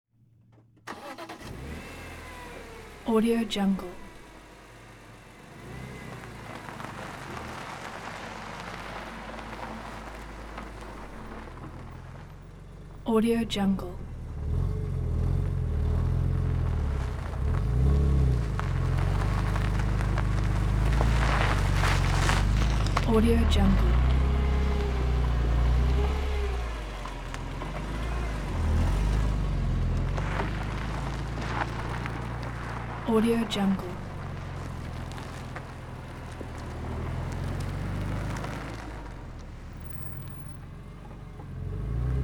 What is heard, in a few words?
2002 bmw m3 car start; prosoundfx